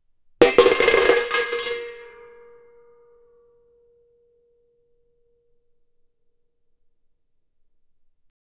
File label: something dropped in a; balloons